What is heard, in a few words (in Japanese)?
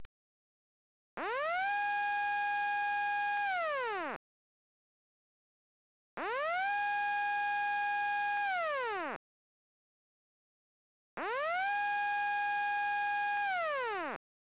ootsunami sairen